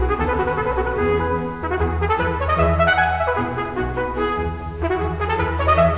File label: trumpet